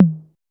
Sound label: 808TOM1 MID; Drums In Your FACE!